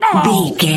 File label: voice male hurt pain